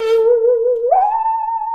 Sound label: saw danger, music, zombat